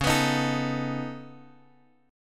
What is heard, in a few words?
C sharp 7th Suspended 2nd Sharp 5th, C sharp, down, Guitar, Drop D